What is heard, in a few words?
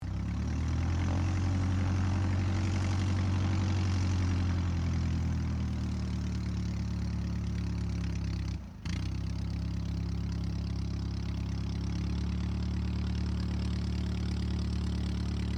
AIRCRAFT DH82A DEHAVILAND TIGERMOTH TAXI SHUTDOWN; RAAF Tigermoth; Airplanes; Vehicles